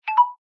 Phone

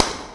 Hand Clap

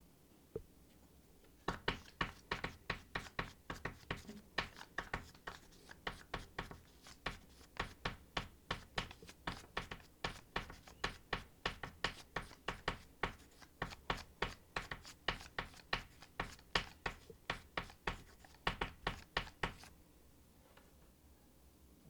Chalk Writing Fast

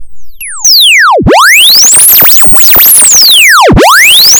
Radio Signal

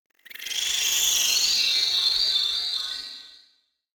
Dream Soundscape

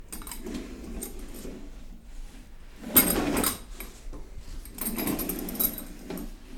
Shower Curtain Pull

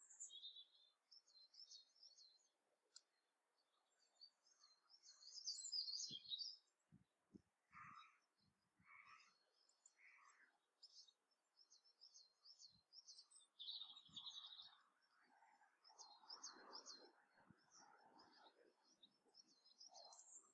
January Morning Birds

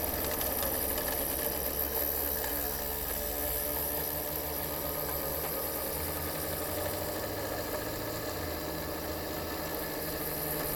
Computer Drive Failing

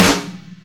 Snare Rock

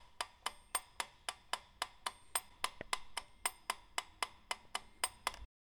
Piano Ticker

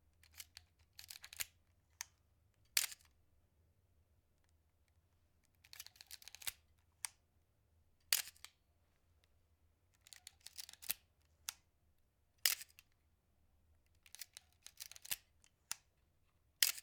Film Camera Load And Click

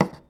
Lay Down Object

